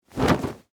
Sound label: Short Sleeve Shake, Foley, Clothes, Short, Sleeve, Shirt, Shake; Dressing; BlastWave